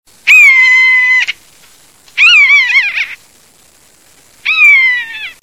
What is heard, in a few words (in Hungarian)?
egeresz olyv birdsound